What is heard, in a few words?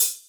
Ride; Equipped Music; Loungin House